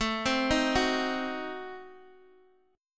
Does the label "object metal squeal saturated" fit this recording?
No